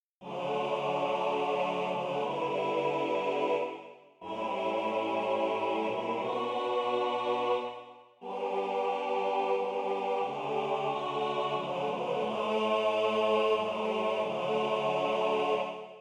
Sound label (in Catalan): Pel desert